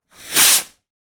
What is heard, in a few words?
wizard shoot; Kilokem; Defensaria